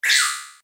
Collect Token Gaming Fx; Video Game Sound Effects; electronic sound effect

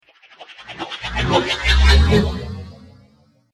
Dance Riser

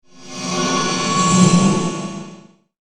Wizard Magical Appearance

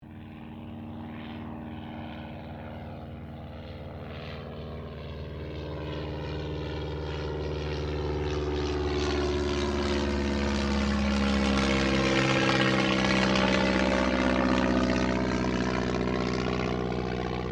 AIRCRAFT DH82A DEHAVILAND TIGERMOTH PASS; RAAF Tigermoth; Airplanes (Sound Effects:Vehicles)

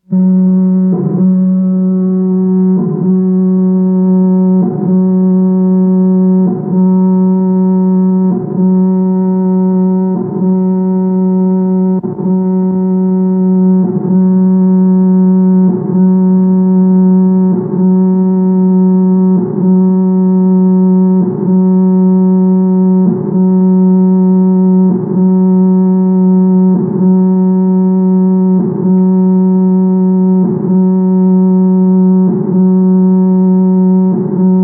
Electronic Signals, Computer atmosphere; Computer Sound Effects; BBC

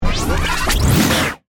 Stinger Digital, Stinger, Digital, Swirl; Production Element, Imaging Element, Accent, Transition; BlastWave

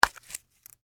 Foley, Button, Snap, Open, Leather, Case; Camcorders; Blast Wave

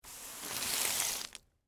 Impact Broom Sweep, Wood, Debris, Single; Cleaning; BlastWave

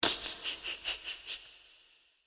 Sneaky Villian Laughter With Reverb, evil laugh; Evil Laugh Sound Effects

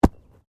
Body movement Organic Impact Medium

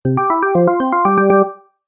Organ Game Music; Cartoon Music Sound Effects

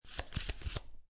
Playing Cards Flip Three Cards Off Top Of Deck

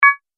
Multimedia Verified, beep

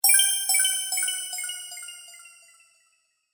Fairy Sparkle Delay, magic; fiction sound effects

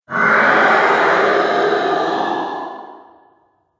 Upstairs Dragon, Monster; horror sound effects

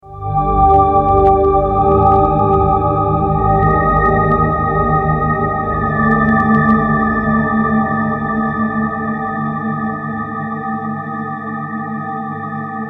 Synth, Swell, Ghostly, Ethereal, Horror; 5.1

